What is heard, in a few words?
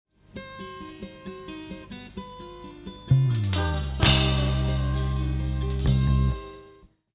lofi